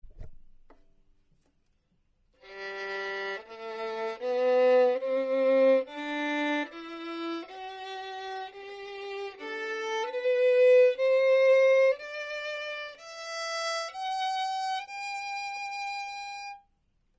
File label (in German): g dur low